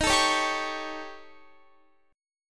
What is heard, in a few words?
Eb 7th Flat 5th, Eb, Guitar; strummed